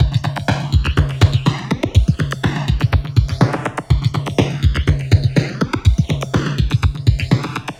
Phase Loop, Drums & Percussion, Electronic; Sonic Foundry (Sony Creative Software) - Electronic Point Blank

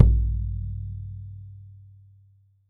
FRAME DRU, FRAME DRUMS; Voice Crystal - Steve Reid Definitive Percussion

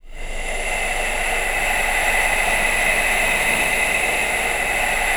NOISEBED, VOCAL WIND

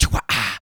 PERC, MALE PERC; Spectrasonics Vocal Planet; Jazz FX

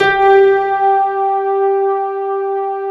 ISLAND PN

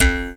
jaw, Didgeridoo and Other Primitive Instruments

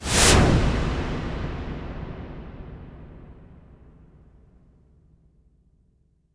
IMP WIPE, Cinematic Impact

